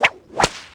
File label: whip; beppo